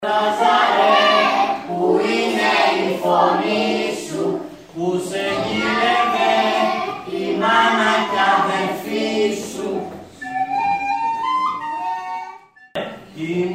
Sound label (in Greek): Τα Κάλαντα του Λαζάρου